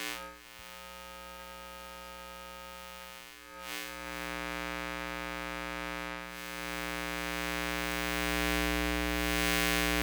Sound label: Electromagnetic Fields